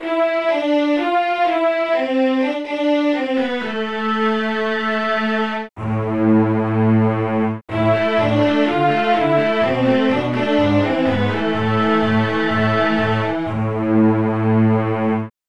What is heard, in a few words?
slow strings